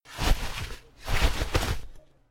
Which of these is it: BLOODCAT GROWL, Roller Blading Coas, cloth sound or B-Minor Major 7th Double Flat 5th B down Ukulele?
cloth sound